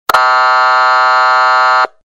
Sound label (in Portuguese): campainha alerta